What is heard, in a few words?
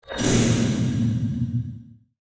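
notification skill scifi hand to hand kill; war ui; esmteam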